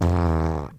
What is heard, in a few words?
fart